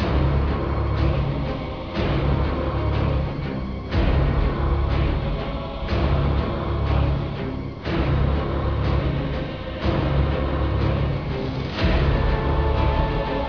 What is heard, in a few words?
start music swarm plague, zombie; cstrike; IKS ZOMBIE